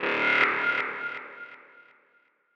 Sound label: chime; chime sharp; only way in chime 10